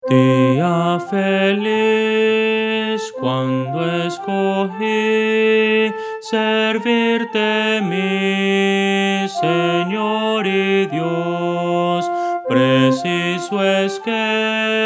soprano, himnos cristianos

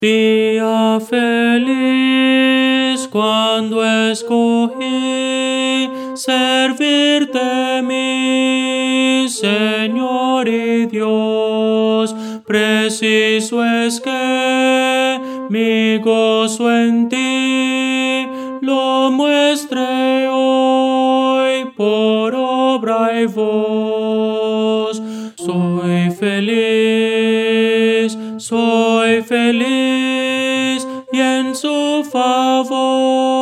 tenor, himnos cristianos; dia feliz cuando escogi

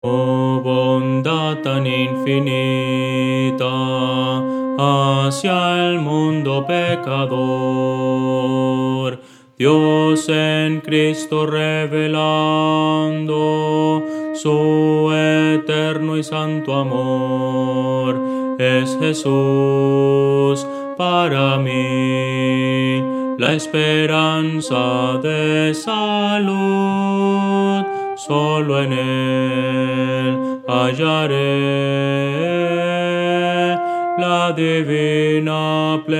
contralto

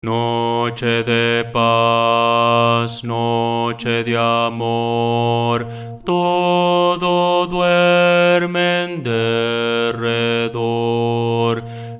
bajo, himnos cristianos; noche de paz noche de amor

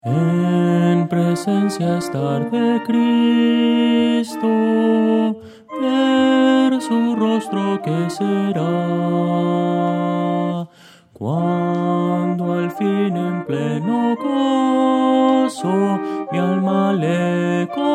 melodia

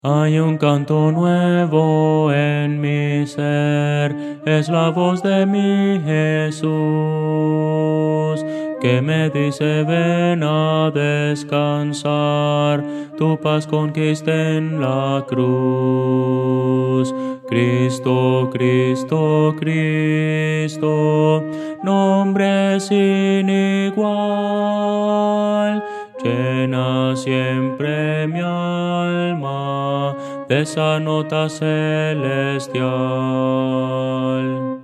contralto; Voces para coro

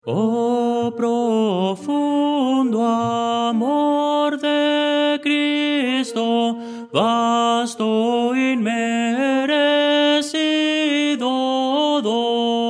tenor; oh profundo amor de cristo

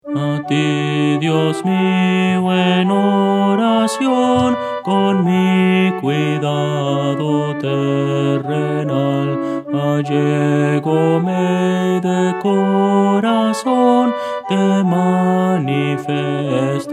contralto; Voces para coro

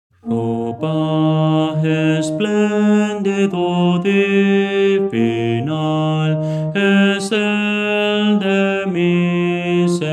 bajo

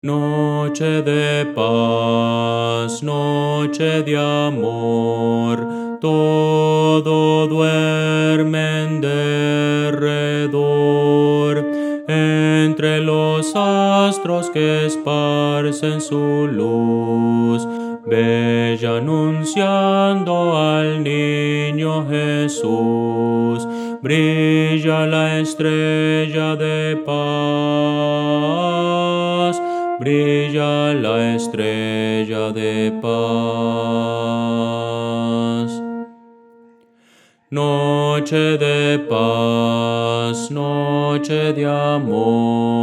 contralto; noche de paz noche de amor

